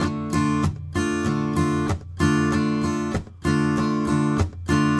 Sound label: RIFF, Blues Guitar Samples; ILIO; Fretworks